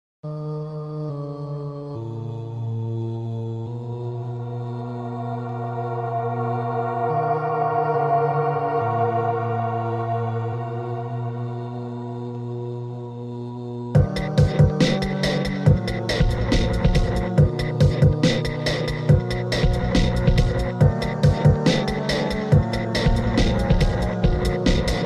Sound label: Beat; Avatar